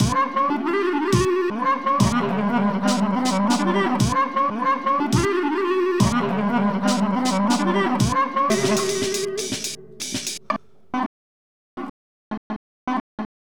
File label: Clarinet Break